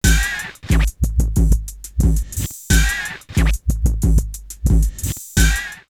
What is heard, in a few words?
90, drum loops, old school; X-Static Goldmine; 90 s Sample CDs